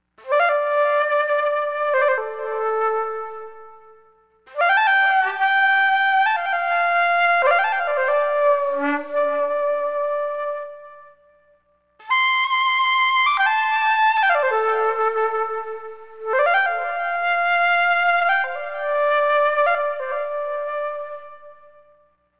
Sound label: shakuhachi